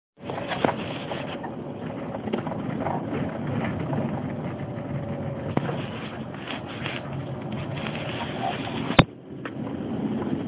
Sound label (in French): couinement